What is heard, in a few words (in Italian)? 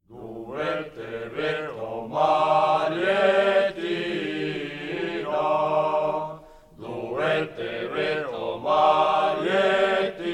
coro; duve te vet o Mariettina; BRIANZA PRIMA PARTE - CANTE, CONTE, CORI, FILASTROCCHE E BANDE DI FIRLINFEU